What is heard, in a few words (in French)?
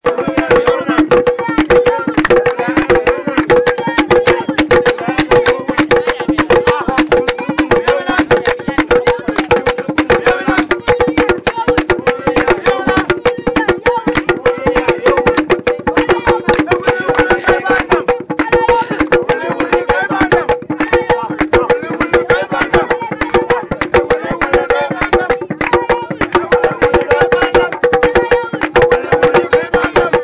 Bawɗi (Tambours de lutte), village maritanien de Djéwo